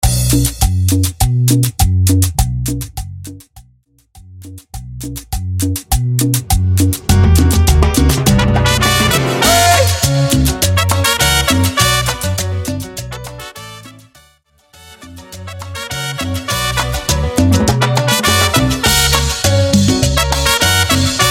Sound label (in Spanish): Intro Simple 102 Bpm